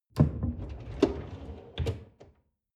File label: folding door open